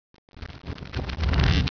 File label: longbow